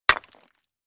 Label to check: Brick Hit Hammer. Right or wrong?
Right